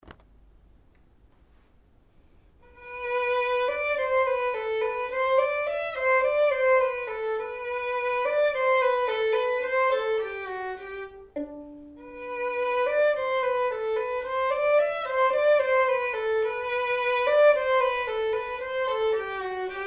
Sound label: perf vln